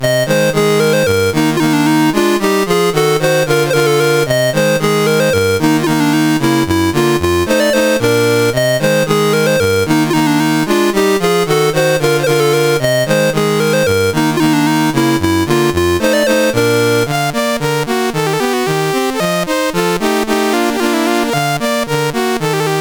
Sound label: Polka, CSG8580R5